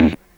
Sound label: Kick Hi Speaker, Stylophone Beatbox